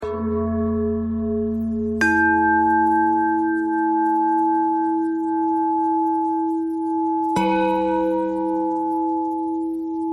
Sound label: bell bell thread noise